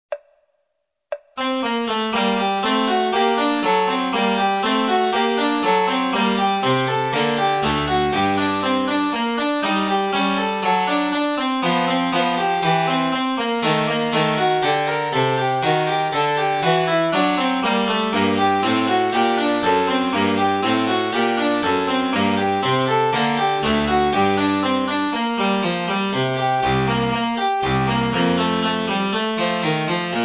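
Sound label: flute sonata BWV 1030, oboe; bach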